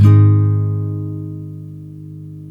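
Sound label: NYLON, G# MAJOR DOWN, CHORDS; Spectrasonics; Hans Zimmer Guitars VOL-1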